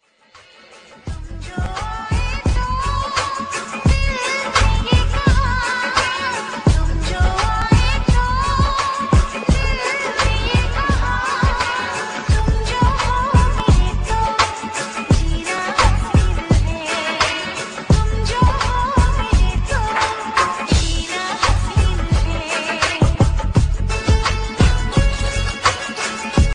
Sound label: hindi remix